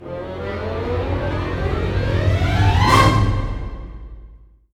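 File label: 158 magic, orchestra; East West; Scoring Tools; Magic Bottle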